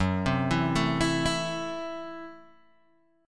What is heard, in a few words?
Gb Minor 7th Flat 5th, Guitar Open G